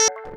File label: ALARM FX; Big Beat Samples & More; AKAI; ALARM KIT